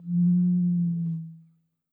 conga drum moose call

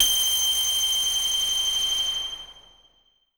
ice beam low long

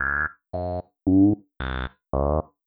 synthesizer voice aiueo low

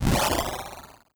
strengthen

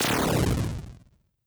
lightning magic lowbit

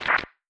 filtered noise

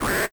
toaster oven lowbit knob turn

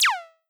standard shot long

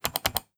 mouse click x2

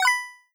coin get

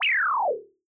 fall

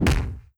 heavy blow

